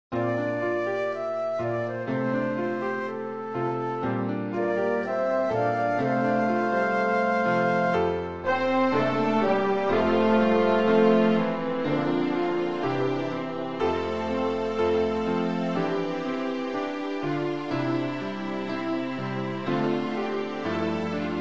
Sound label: music; saints